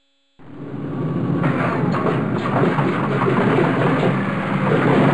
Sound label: startup; BOMBER III